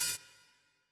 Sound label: Open Hat